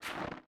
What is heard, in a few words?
Step Snow; Game rip; MiSide